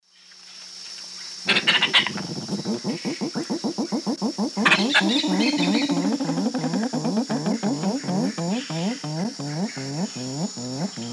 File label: Psophia crepitans, songs 2 birds; S. America; Eastern Lowlands